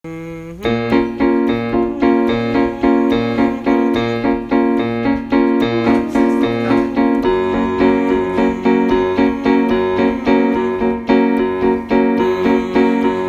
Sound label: Death waltz piano; Dark cabaret meets musical theatre meets rock